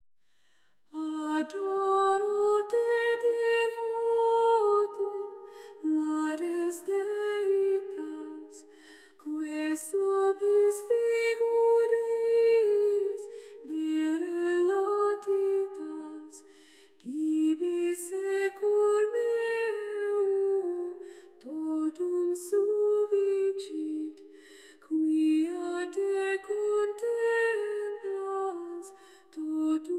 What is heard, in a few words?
Adoro Te Devote Female, gregorian chants